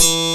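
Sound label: strat sustain E3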